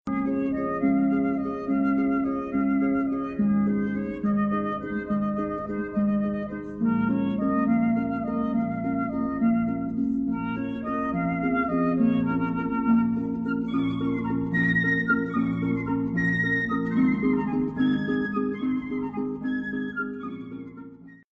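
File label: Flute & Harp; Brian Boru's March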